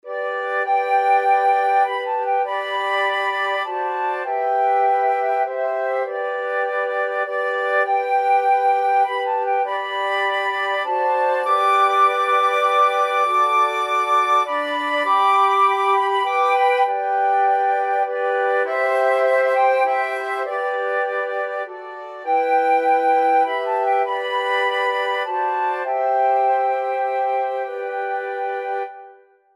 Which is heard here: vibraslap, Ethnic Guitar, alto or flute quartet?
flute quartet